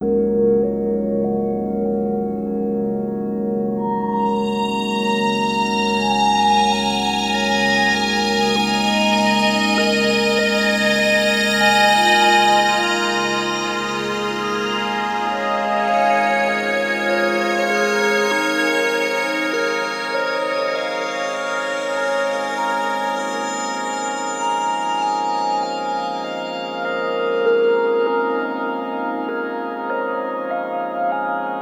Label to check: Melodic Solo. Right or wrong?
Right